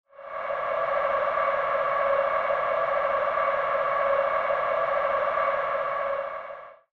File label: Soccer Cheer; SNES